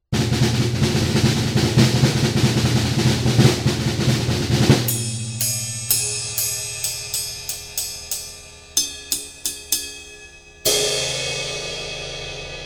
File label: drum roll cymbal crash